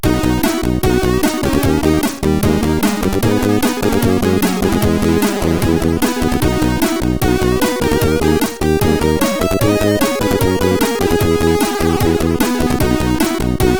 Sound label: Intro, sid CSG8580R5